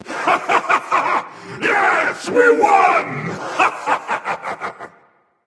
yay; smite; ahmuzencab

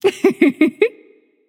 laugh light; smite; voicepack; hel

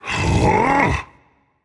confused rage; chaac

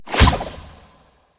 cocoon initiate; arachne; smite; arachne skin9

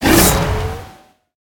activate; Smite; Tiamat

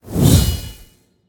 swing metal; Smite; Cabrakan

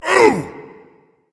ouch berserker; cuchulainn

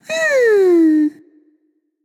sigh, voicepack; smite; jorm

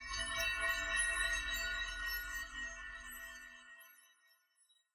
astrolabe spin; smite; god atlas

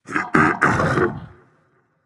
clear throat; smite; guan yu

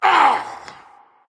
grunt; smite; Vulcan

